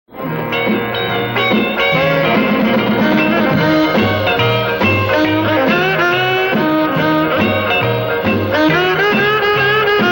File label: Untitled instrumental